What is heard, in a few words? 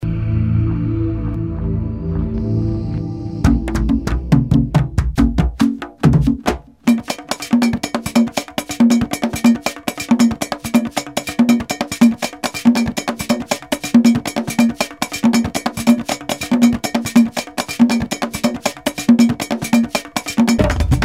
African Drums